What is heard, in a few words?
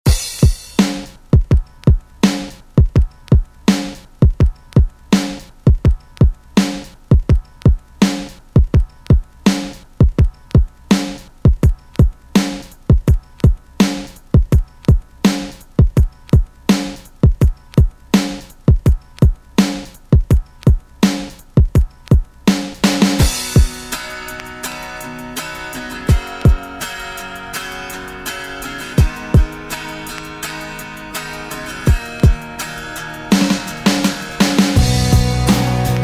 Rock Redrum; Deejay Hit